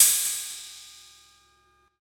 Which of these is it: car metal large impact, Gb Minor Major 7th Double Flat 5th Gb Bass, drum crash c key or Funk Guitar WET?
drum crash c key